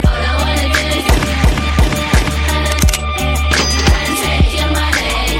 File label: gunshot gunshot cash register noise